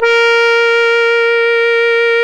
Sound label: A#, Synclav FM, BRASS; Big Fish Audio